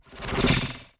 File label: Jolt Standard